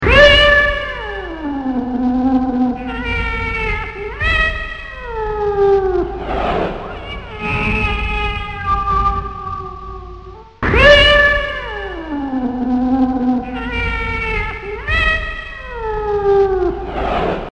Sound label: catfight